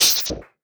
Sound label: Percussive Hit, contact mic; musicradar; contact mic madness